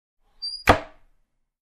Creaky Cabinet door close

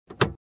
Cockpit interior compartment opening

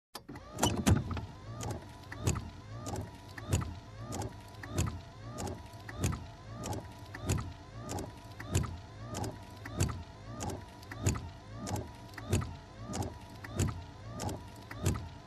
Windshield Wiper

